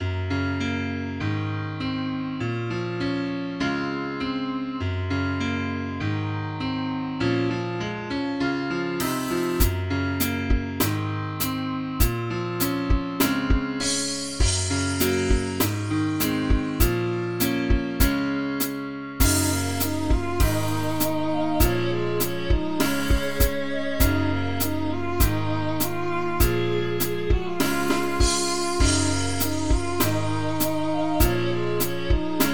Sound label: Drums; FluidR3; Dragon